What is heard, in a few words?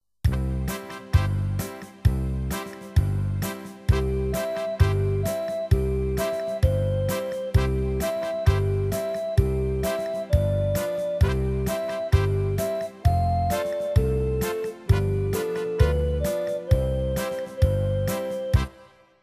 Scripture Music